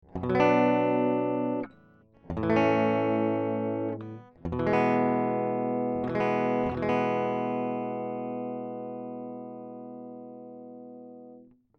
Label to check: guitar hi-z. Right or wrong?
Right